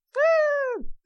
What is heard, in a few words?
aaaahhhhh